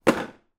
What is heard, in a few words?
industrial tool box small plastic few tools inside place down